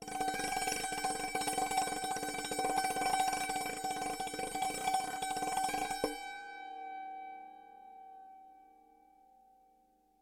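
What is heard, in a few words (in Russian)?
индийский сантур звонкий мистический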